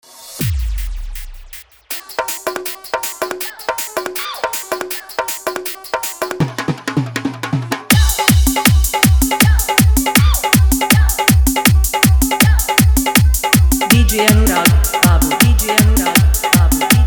Bhojpuri Full Dhollki Bass Dance Mix

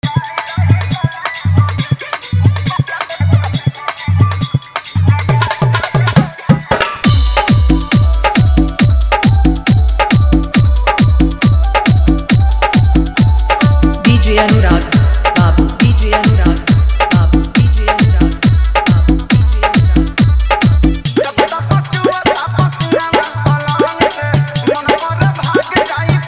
Hard Bass Remix

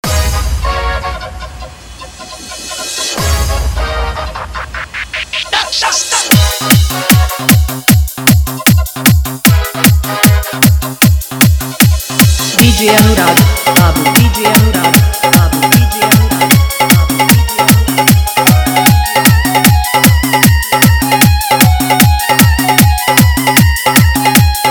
Dhollki Bass Dance Mix

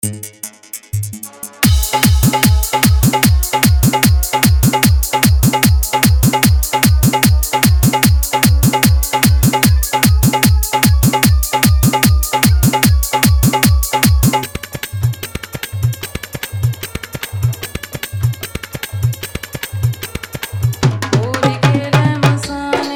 Fully Hard Dhollki Bass Dance Mix; Holi

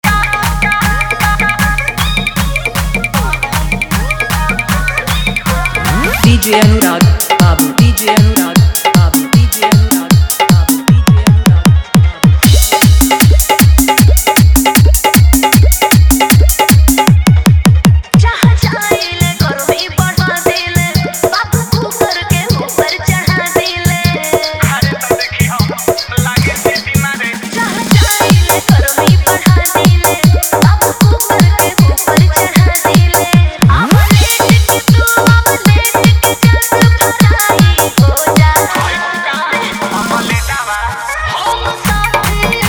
Full Hard Bass Mix

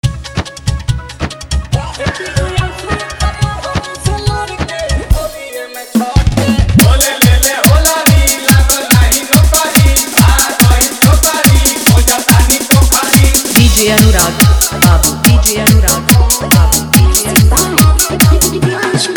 Full Dhollki Bass Mix; Holi

